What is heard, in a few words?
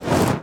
Swish Wipe